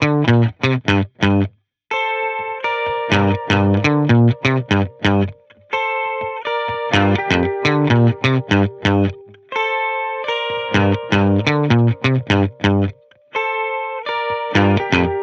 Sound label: guitar loop 126 Gm; Pop; Guitar Stacks; SD PASADENA Pop Sample Pack; Slate